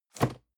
cardboard box medium place; objekte

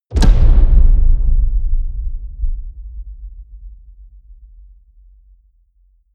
drum hit hollow large; music elements; percussion

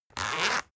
zipper open; objekte; reissverschluss

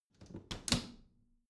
bathroom cabinet close regular

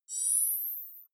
navigation point

